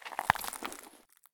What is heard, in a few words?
prone glass; Escape from Tarkov